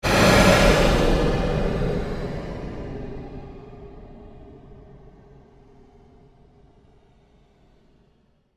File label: Created Pulse Long Fade, Rise And Dive Sound Effects; Sound effects created by manipulating other sounds; Rises and Dives